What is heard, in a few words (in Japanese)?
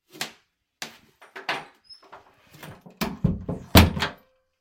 トイレの扉の開閉音; toilet